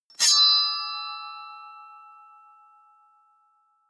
sword wield ringing

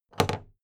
big plastic case handle bounce heavy

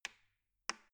embedded button press slow

